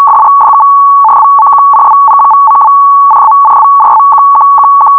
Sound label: Fsk Hell, modes